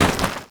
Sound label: Trench Netting Destroy; Gameplay; Warframe; Tau; Destructible Dynamic